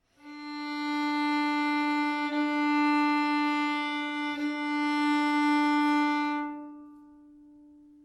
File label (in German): stimmton d